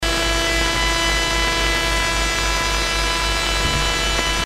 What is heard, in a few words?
amp noise